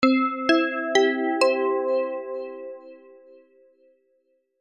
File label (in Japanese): pinponpanpon